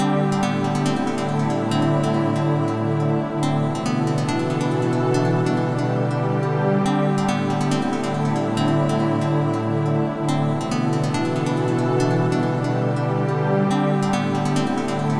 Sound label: Chilling Music